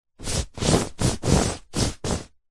household bedsheet movement fast rubs hand flatten; zapsplat